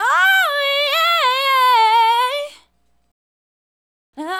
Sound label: Female Solo, Hip-Hop and RnB Vocals